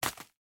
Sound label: Food Drop; Game rip; MiSide